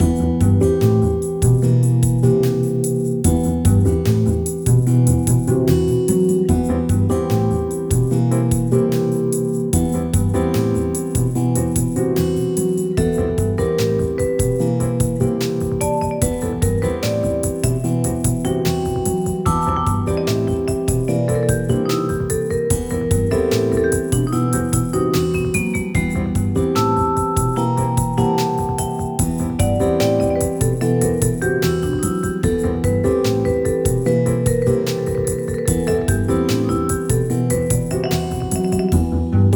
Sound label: heavy atmosphere